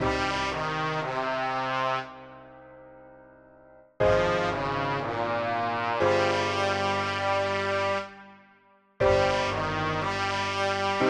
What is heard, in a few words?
dramatic long, music